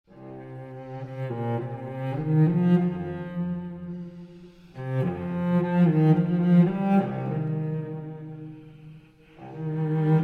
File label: Evening of Roses (For Cello, Clarinet & Ensemble)